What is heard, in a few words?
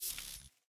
armor; smite; ahpuch skin10